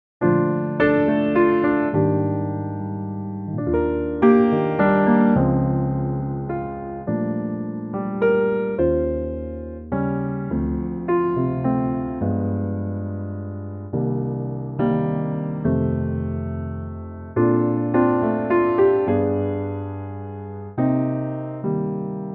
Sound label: easy to remember Bb piano